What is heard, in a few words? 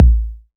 808 KICK; AKAI S6000 CD-ROM - Volume 4; Kick 2